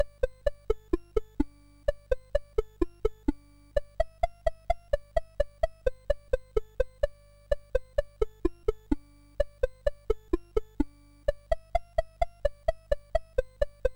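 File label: Petch, MOS 6581 SID chip music, games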